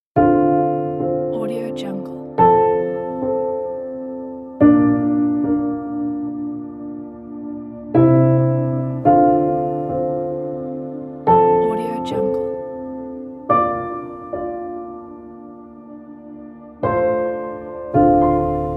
inspiring ambient piano

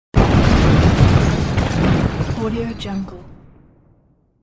explosion with debris

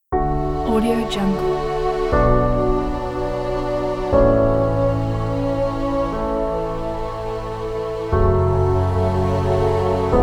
ambient strings background; perfectarium

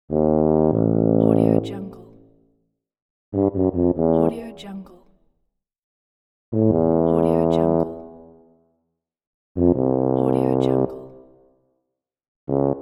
tuba game fails

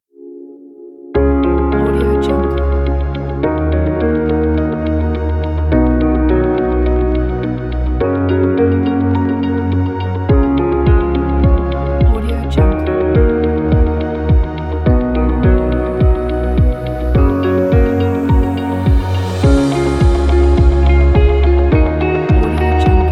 ambient music

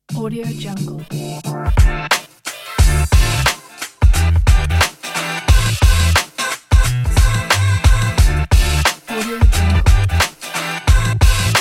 fresh summer hiphop beat

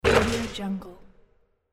basketball missed shot

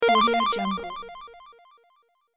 retro 8bit video game start sound

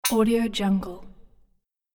app developer game sound element

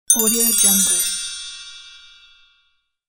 mid ascending belltree

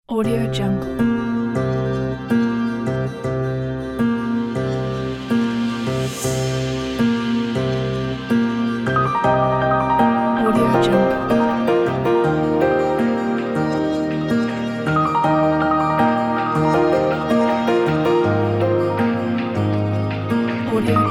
abstract deep hip hop

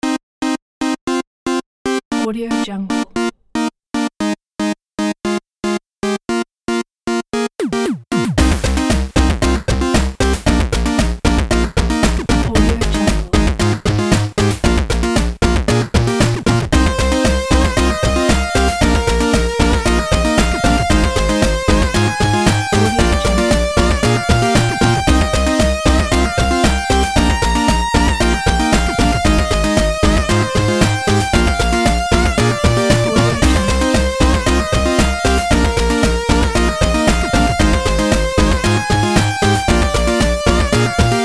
8bit dance pixels looped

